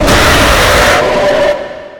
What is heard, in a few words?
attacking; weird chica